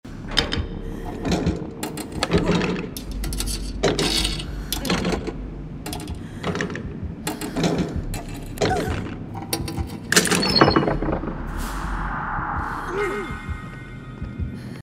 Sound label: chest; Dead by Daylight